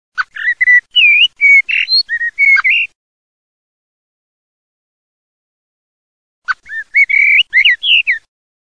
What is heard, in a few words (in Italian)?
MERLO MERLO